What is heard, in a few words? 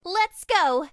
Dash